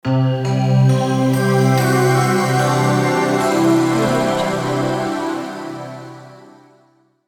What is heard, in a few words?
synth magic logo